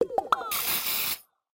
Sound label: pin sound